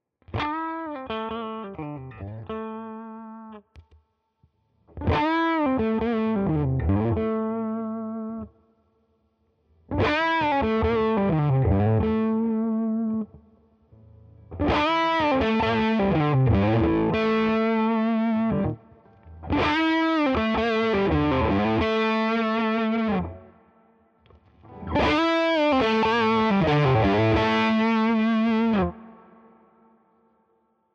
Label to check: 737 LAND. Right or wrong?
Wrong